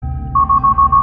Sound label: Tesla Danger Sound